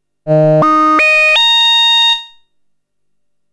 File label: Behringer Neutron VCF Keytracking High